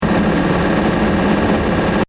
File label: Engine: Medium Revs Loop, car; Diesel; Cars (Sound Effects:Vehicles)